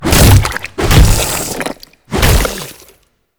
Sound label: Infested Ancient Toxic Tentacle Swing Flesh